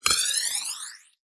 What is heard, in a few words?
Multimedia Internet CD-Rom Flash Wipe